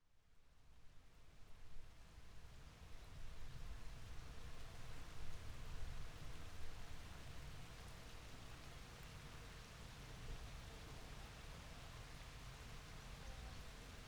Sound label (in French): Pluie fine et orage lointain